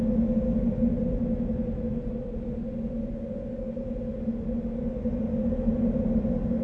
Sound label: eerie wind loop; cstrike; qn winterhole